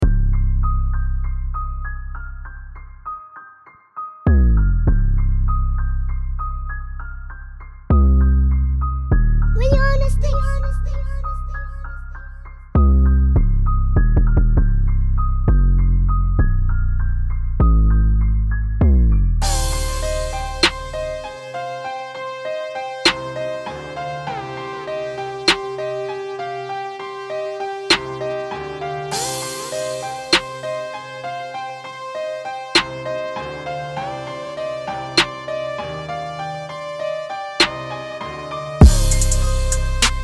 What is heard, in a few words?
Instrumental